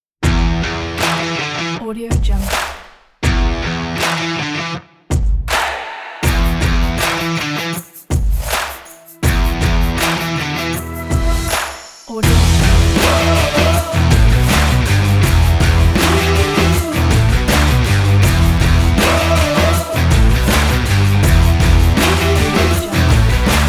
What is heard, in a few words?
sport rock